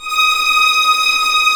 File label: D#6 TREM, VLNS TREMOLO, String; East West; The Ultimate String Collection